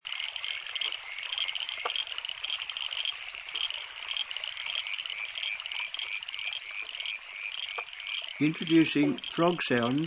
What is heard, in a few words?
frogs; vaswhite